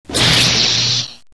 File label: force lightning hit